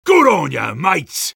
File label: sniper cheers